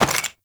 Loot Bag Drop; Objects; Pickups

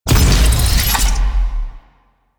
Timer Complete; Kahl Brother; Warframe; Veilbreaker; Devourer Kahl

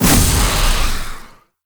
Survival Orb Destroy; Warframe; Zariman

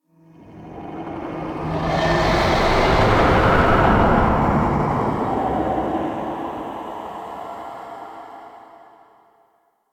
Spectral Whoosh; Ambience

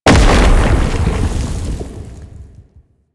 Octopede Horrors Rock Fall; Warframe; Man In The Wall

